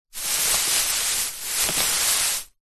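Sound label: tinsel decoration christmas short handling; foley; zapsplat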